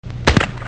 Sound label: Skeet Shooting